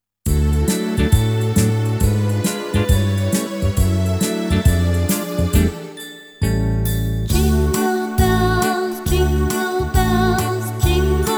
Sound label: Jingle Bells; kleuter-musiek